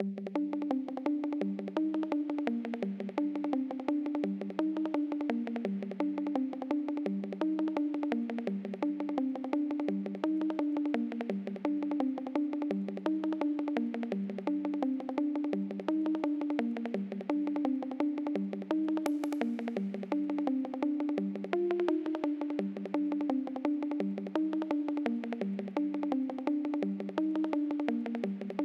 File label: Melody; one dog story